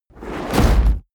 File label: fire whoosh experimental small